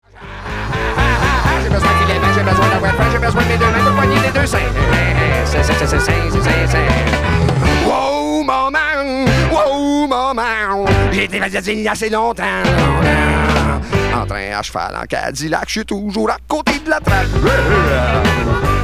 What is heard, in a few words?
rocknroll